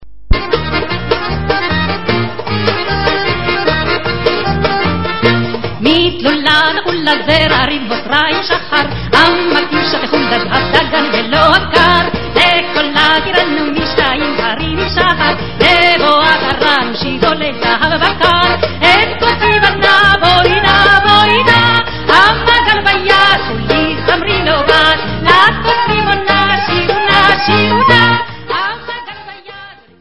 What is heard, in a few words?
VOCAL